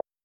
touchscreen button tap; VideoPoker

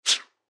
swoosh movement; 2048legend

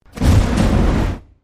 connect; monstergo; pokebot

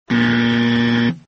buzzer; inlogic-basketballmaster

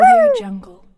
die woo; Rolling-Panda

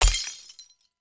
hearts Broken; Casualino

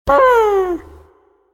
connect; monstergo; pokebot

